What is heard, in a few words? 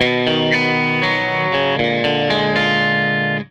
Tele Guitar Loop